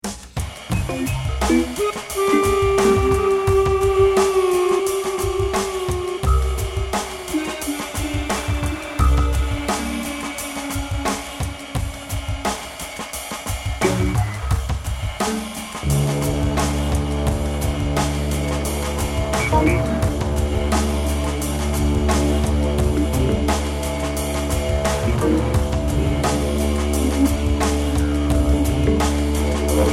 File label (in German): musik didgeridoo didge